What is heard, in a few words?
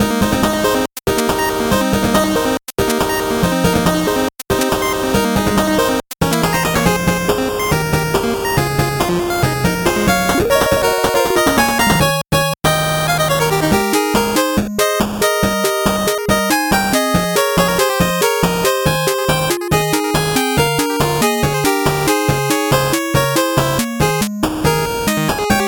8bit bgm slow